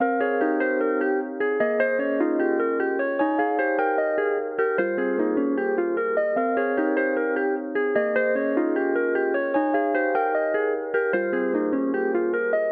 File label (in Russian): zatsiklennye melodii dlia gitary loop